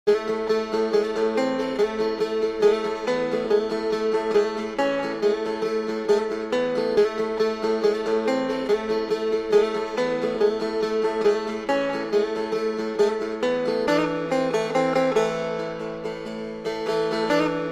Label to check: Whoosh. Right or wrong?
Wrong